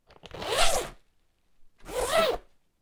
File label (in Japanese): ファスナー